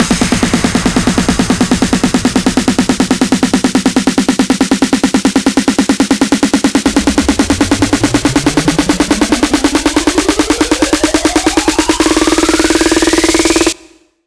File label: Snare Build FX 140 BPM